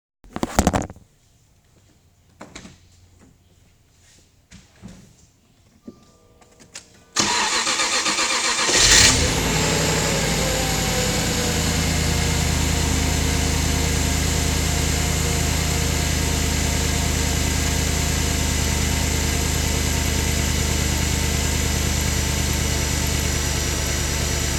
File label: xkr rough start; xk8 xkr x100